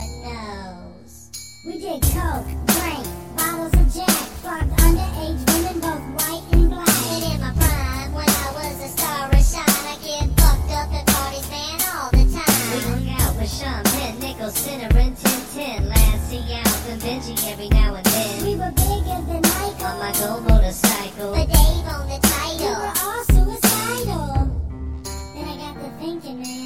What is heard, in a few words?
chipmunks rap ringtone